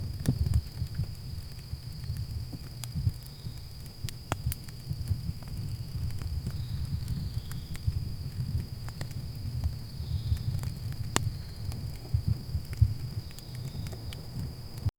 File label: crackling campfire under